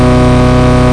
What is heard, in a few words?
revving; Stunt GP